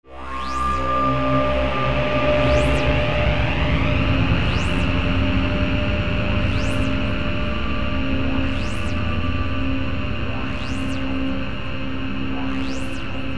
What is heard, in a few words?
Sweeper Blip